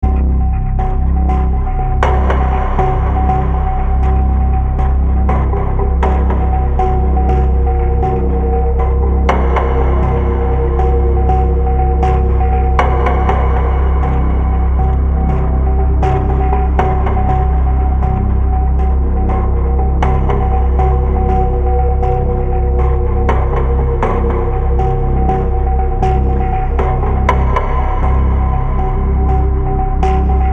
Box Cutter

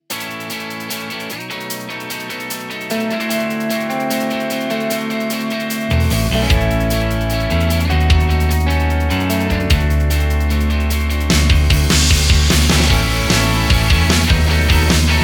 Brit Pop

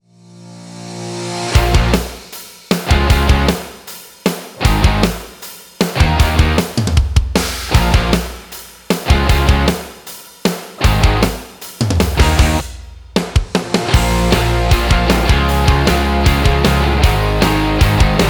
Dirt Track

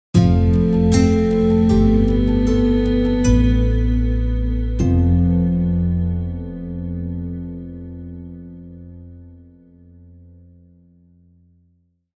Mystery Ident

